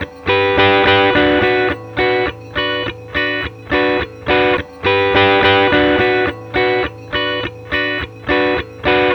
Guitar, Indie Rock; Sonic Foundry (Sony Creative Software); Indie Rock Anthems

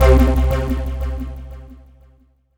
C2, SynthLine; 90 s Sample CDs; NiceBeats; SynthLine VOL-7

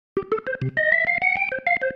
Single Riff, Organ

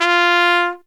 TRUMPET FX, Phantom Horns; Zero-G

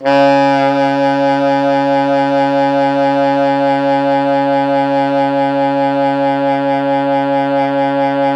Pop Brass, C#2 -L; Best Service ProSamples vol.19; AKAI

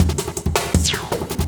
Loop, Drum & Bass, 160 BPM

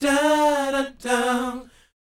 BOYZ, Vocal, R&B Dance

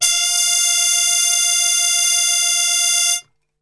F 3, Brass; Best Service; Brass Super Section; TRP HARMUTE; 90 s Sample CDs

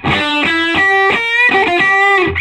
SOLO G, Vintage Blues Guitar; USB Soundscan vol.22; AKAI

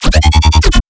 blaster bass

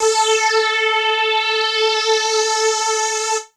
lead syn, Techno Trance; Ueberschall; Techno Trance Essentials; SYNTH

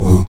VOX AHOO, Afro Chants, Stacc Ah Oos

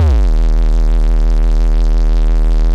BASS SHOT 808 Eb

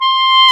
WND CLAR F, Clarinet; Roland L-CDX-03 Disk 1; Clarinets

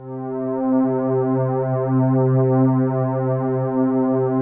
CHRD PAD; Best Service; XX Large Pads; Sample CDs

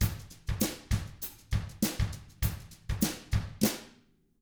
FUNKY, Drums; ILIO; Double Platinum Drums 1; 99 FUNKY 1

